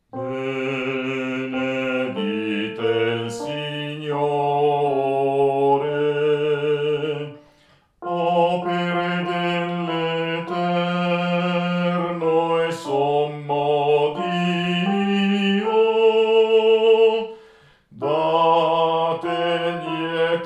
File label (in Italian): Contralti; BENEDITE IL SIGNORE